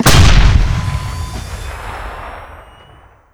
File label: gauss fire, weapon